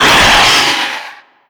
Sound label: death, antlion; creatures; vox